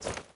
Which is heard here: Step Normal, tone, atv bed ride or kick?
Step Normal